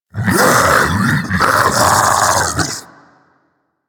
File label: Infested Splitter Split Vocal Alerted; Warframe; Grey Strain